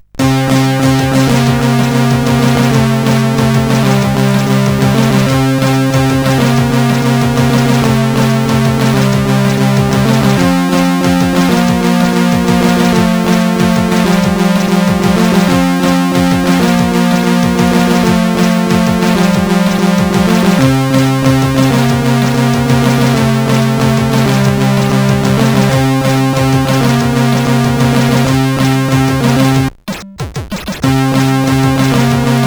Intromusic sid CSG8580R5

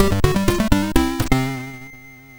sid MOS6581R2; Keystone Capers

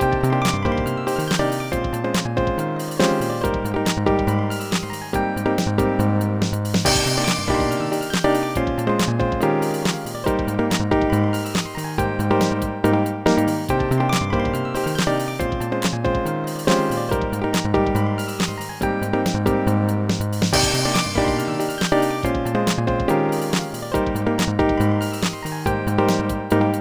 solo; Dr. T's MIDI Recording Studio

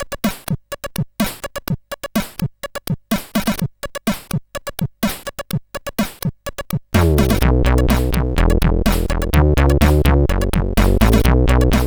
Intro, CSG8580R5

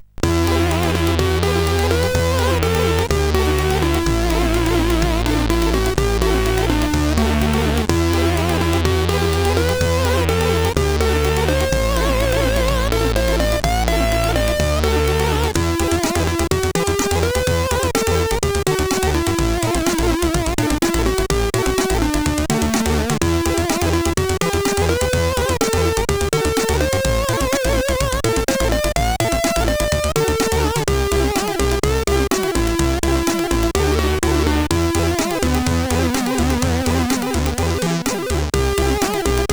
Intro Tune, CSG8580R5; Paradance; Schutten Martijn

